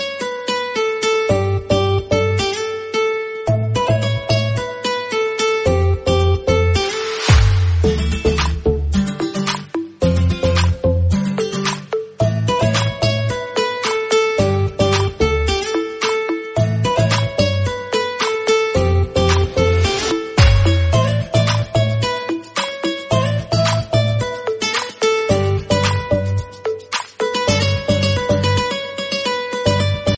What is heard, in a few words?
Romantic Flute BGM Ringtone